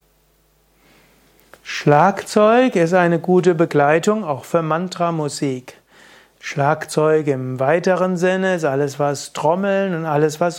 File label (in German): Schlagzeug